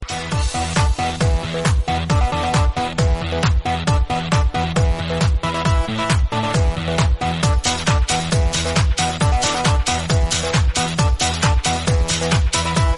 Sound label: sicilian italian dance tune